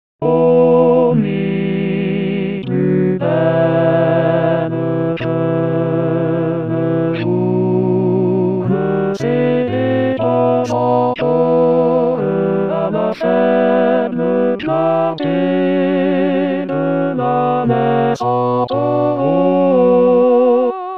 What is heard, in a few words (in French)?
tenor